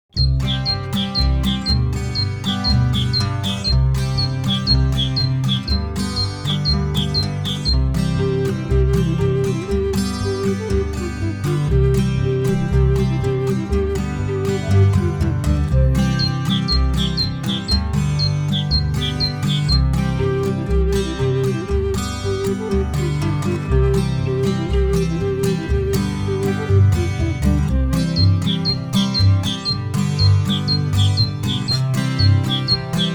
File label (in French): La charbonniere instru; cuicui et compagnie